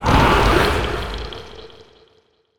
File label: Distant Absctract Scream Short; Monster Sounds; TheDeclineOfWarriors; Monster Sounds Volume II